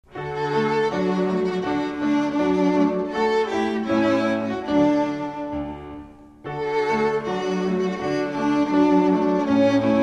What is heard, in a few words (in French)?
Chantes Enfants musique; Donne Dit Le Ruisseau